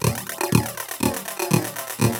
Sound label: LOOP, TECHNO; TECHNO-BOX; ELEC-LOOPS